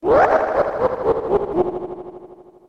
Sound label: Noise; I Hate You Super Mario World hacks; online SNES Windows gamerip 2016; Luigi